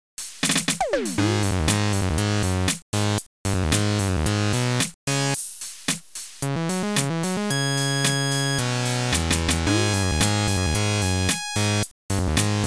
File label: Ringtone; Spider Banana (Phoneutria Nigriventer)